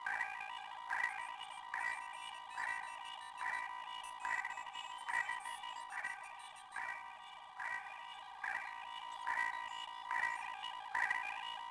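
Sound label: telemetry loop; Star Wars Obi-Wan; XBOX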